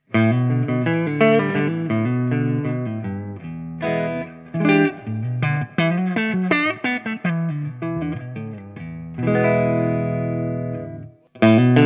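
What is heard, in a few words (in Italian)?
Kungfuzz leggero booster, guitar effect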